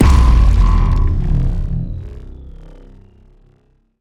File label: Game Restart